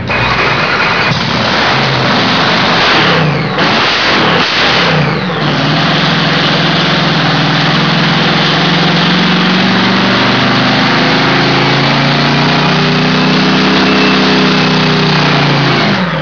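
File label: warmup, drag racing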